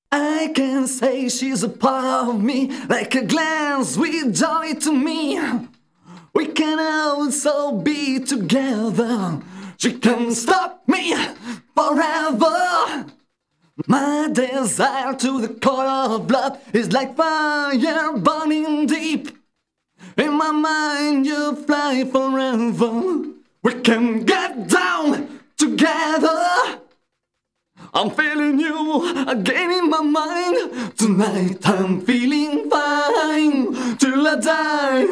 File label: Acappella; Eurobeat